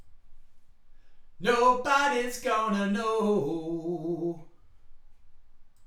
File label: vocals